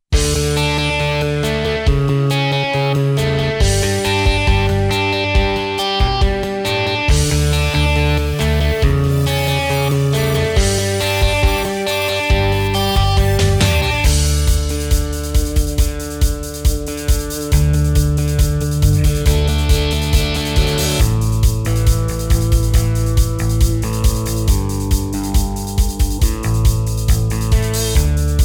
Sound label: Killer Rock style, Korg Pa4X